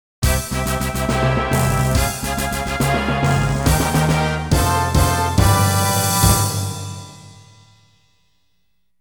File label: Winner Fanfare; Goketsuji Ichizoku 2; Power Instinct 2; playstation; ps1